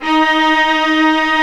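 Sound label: Strings, Violas Agitato; Roland L-CD702; Vlas Sect1-2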